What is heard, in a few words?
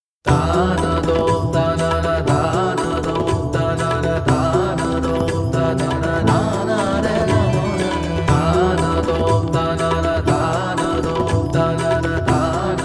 BGM RINGTONE